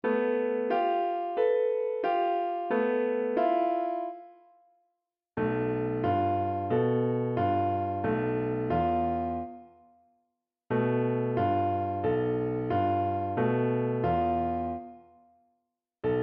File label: Contrary Motion, jazz piano